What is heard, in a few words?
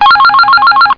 ringring